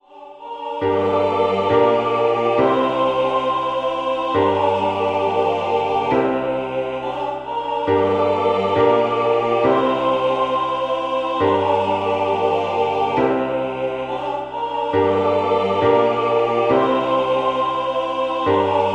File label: MIDI SATB